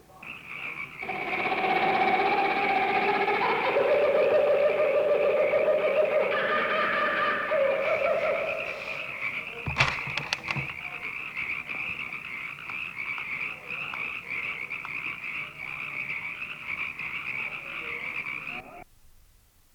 Jungle Night

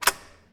Latch Box Office

